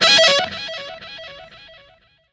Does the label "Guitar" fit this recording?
Yes